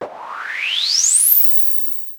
Machine, Retro Games Sound FX

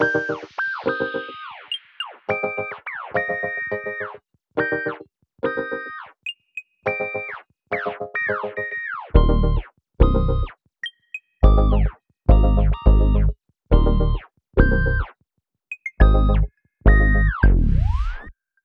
End Stinger, Kawaii Love Lofi Music; Kawaii Love Lofi Music Pack 2

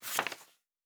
page

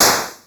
Shoot, Retro Games Sound FX

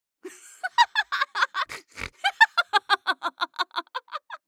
goof

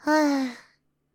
ugh

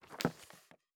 Book, Fantasy Interface Sounds

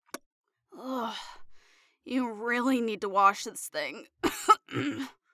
suck

